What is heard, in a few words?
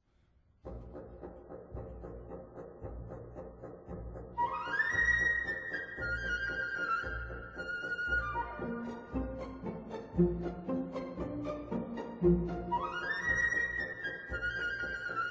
Chinese Dance; Tchaikovsky; Nutcracker